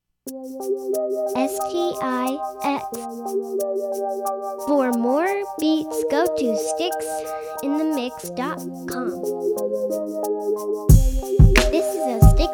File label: sad roland